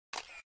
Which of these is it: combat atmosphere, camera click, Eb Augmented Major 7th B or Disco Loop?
camera click